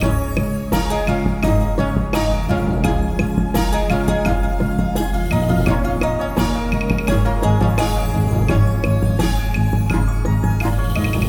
loading ambient; Song Cai Tong Zi

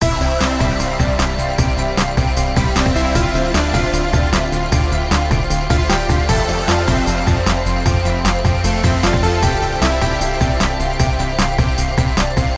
count up; Lucky Joker 5

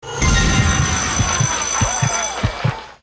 big win; Fruit Express; slot game

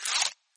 gambling mouseover; games; Fenix Play 27 Deluxe; Wazdan

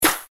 reelspin click; Live Streaming Star

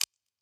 gambling mouse hover; Wild Guns

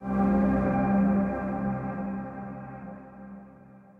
gambler intro outro; Great Book Of Magic Deluxe; Wazdan

